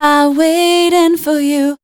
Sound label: voice spectral, ly short